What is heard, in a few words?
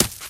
gravel, footsteps run; Left 4 Dead 2; infected